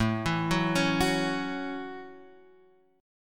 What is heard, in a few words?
A 6th, Guitar, Open F; arpeggiated